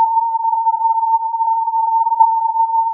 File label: filtered input